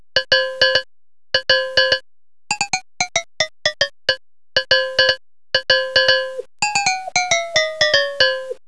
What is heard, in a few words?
Pitchbend Cowbell, drumtraks